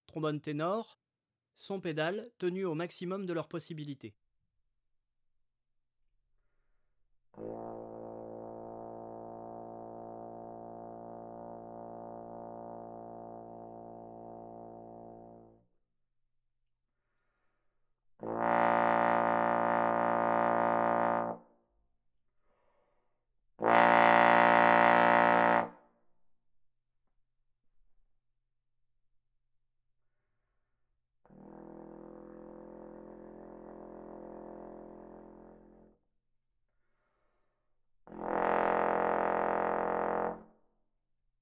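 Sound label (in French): ténor sons pédales, trombone; Textures; Sons granules sonores; traite orchestration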